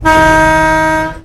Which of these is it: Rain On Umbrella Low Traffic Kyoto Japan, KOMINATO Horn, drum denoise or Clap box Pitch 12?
KOMINATO Horn